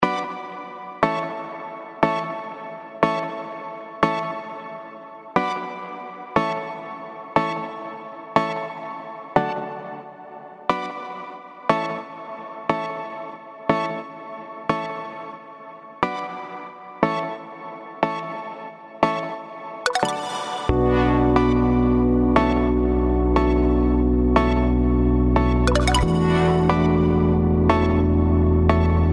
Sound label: Warm Welcome